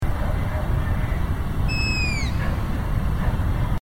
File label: clamator; asio clamator